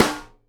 snare GLASS, Live Snares

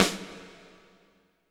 snare pop; Roland L-CD701; Snares 4; Sn Modules 4

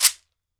SHEKERE, African Percussion; E-MU Producer Series Vol. 7 – Old World Instruments

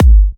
G, kicks; markus hakala kicks

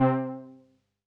505, String Machines

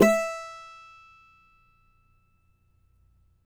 CAVAQUINHO E, Guitars

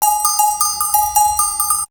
Percussion; Coldcut's Kleptomania VOL1

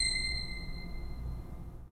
3D Pipe Mix Celeste, 3D Organs

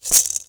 SINGL RATTLE; Best Service; World Colours

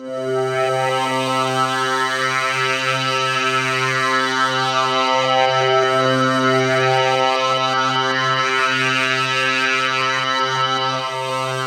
DRONESW, BIG SWEEP; Masterbits; Vintage Voltage

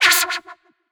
stab pierce, stabs

